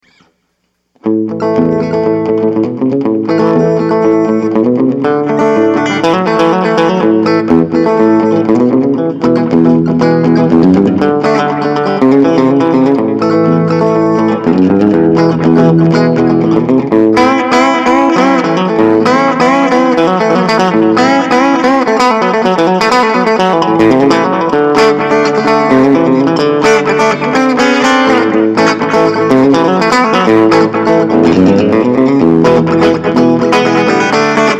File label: country twang